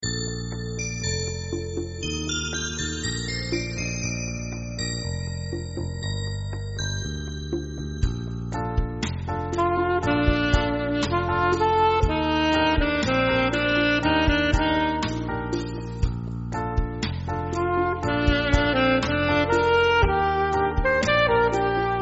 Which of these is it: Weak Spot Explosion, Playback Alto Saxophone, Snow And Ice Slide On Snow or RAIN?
Playback Alto Saxophone